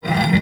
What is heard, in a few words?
Cast Iron Slide; Gameplay; Awakening Remaster